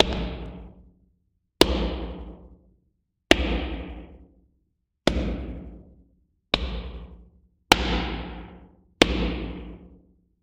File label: stomps; human; NS Studios - ultra sound collection